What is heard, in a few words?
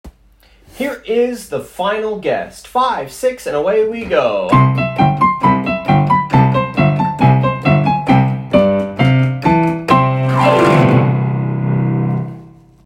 Dance Combo Music